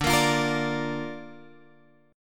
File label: D Minor Sharp 5th D down Guitar Drop D